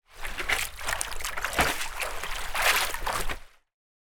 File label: sport surfboard in water movement water splashes calm